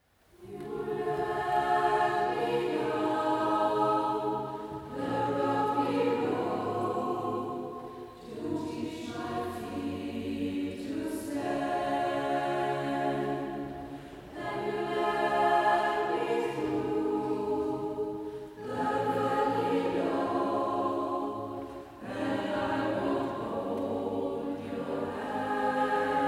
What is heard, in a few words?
Tutti